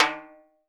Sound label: REPIQUE, Brazil; BRAZIL SET; Voice Crystal - Steve Reid Definitive Percussion